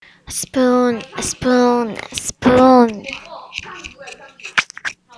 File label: spoon